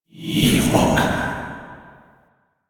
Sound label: Alt Fire Whispers; Weapons